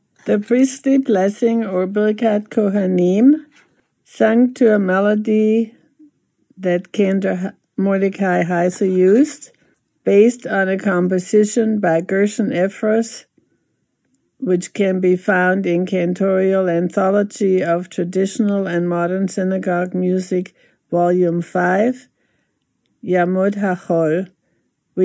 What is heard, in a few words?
Priestly Blessing melody, chanted